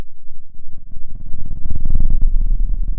pop, Sound of a dinosaur

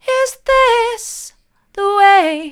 mid, vocal

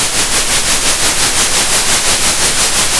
center, Sound of a train

